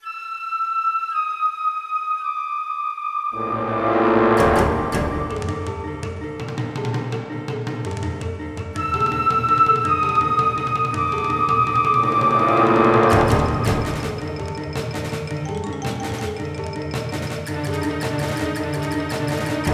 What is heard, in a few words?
Action